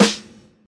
crunchy snare drum g sharp key, acoustic snare tuned to the G# note